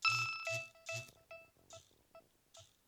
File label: nokia 6100 ringtones rococo